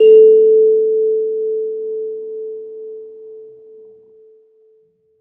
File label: kalimba circleskin A3 mf; Acoustic